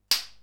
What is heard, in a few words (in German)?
pistole